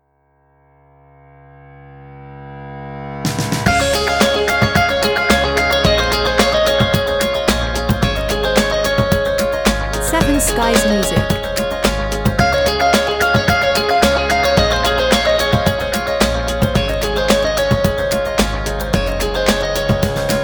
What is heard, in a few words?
Wonderful Life - jingle